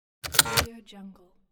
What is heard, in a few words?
fail mechanical